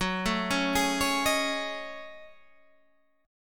Gb Minor 6th Add 9th Gb down Guitar Standard; arpeggiated; JGuitar

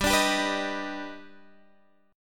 D sharp Augmented Major 7th G, chord

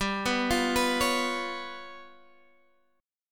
G 7th Flat 5th

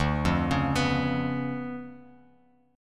D Minor Major 13th, down, Bass Standard; DmM13 arpeggiated; JGuitar

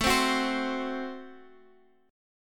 A Minor 6th A, Chord

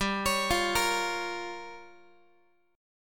G Minor 7th Flat 5th, Guitar; Gm7b5; arpeggiated

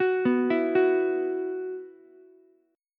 E Suspended 2nd, Ukulele; Esus2 arpeggiated; 11,11,12,9

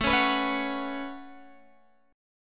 A 6th Add 9th A down Guitar Open G

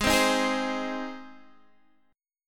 Gsharp Major, Guitar, Half Step Down

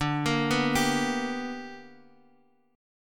B-Minor Major 7th Sharp 5th D

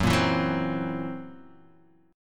Fsharp 7th Flat 5th down Guitar Half Step Down

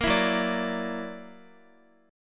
Gb Augmented 7th, down, Guitar; Gb+7 strummed

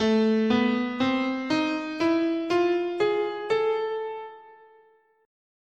A Hungarian Gypsy, scale

